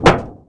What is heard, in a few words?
Metal plane hit; AssaultCube; Alien Stars; American Muscle Series